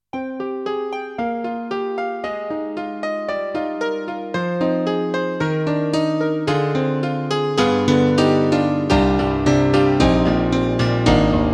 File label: Korg N1R M1 Piano L and R